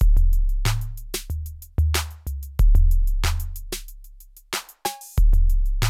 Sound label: 808 LOOPS; Kid Nepro - Elite